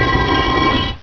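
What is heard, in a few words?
push stone; Hexen2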